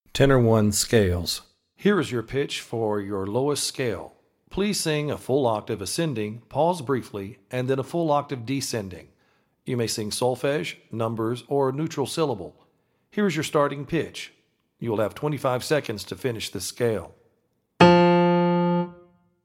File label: tenor 2 scale audition